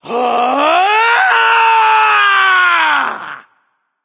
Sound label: battle cry; smite